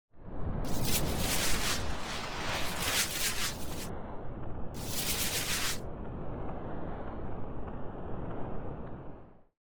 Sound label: Noisy Swirls